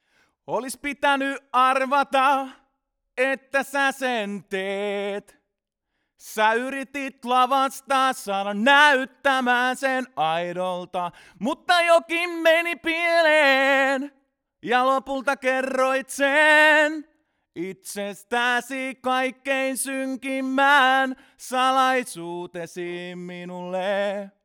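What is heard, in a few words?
male vocal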